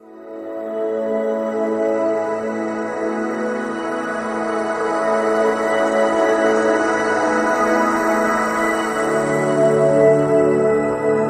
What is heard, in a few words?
Star Pad; OMS Sound